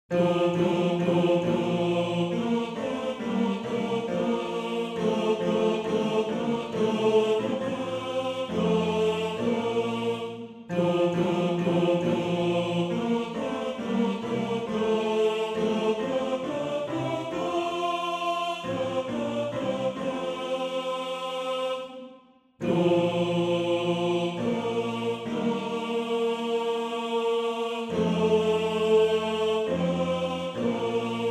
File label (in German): Tenor 2